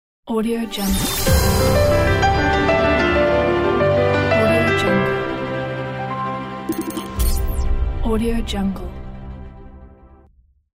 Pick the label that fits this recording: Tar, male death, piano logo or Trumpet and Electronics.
piano logo